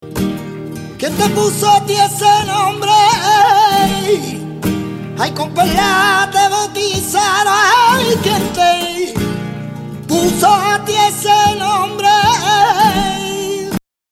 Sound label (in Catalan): Flamenco dictat harmonic